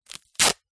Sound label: office parcel tape peel from roll